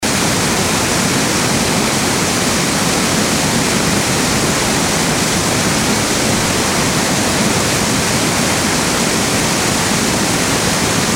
waterfall atmo close

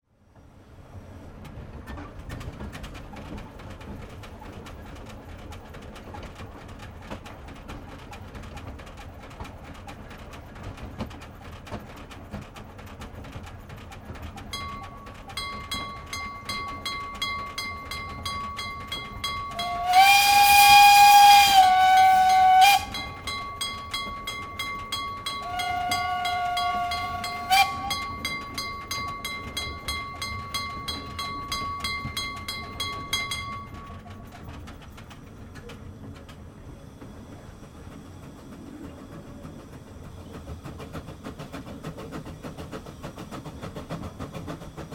old train cabin inside

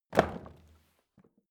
wood drop indoors

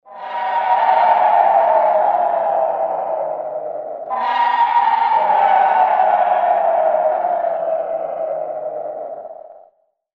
demon honk

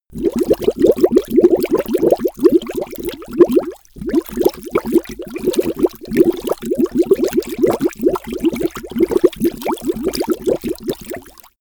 bubble

